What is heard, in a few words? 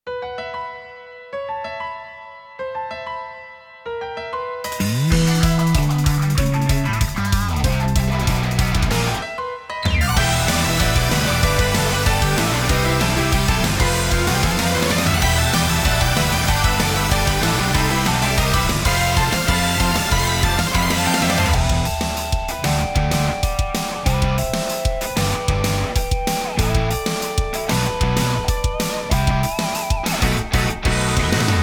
inst